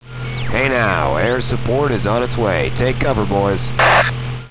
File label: allied strike; breakout; dod